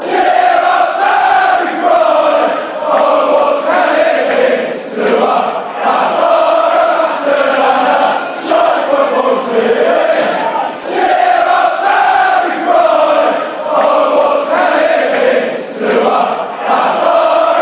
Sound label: cheers, fanchants; barry fry; cambridge